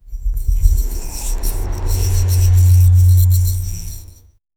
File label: hand brushing and tapping, outdoor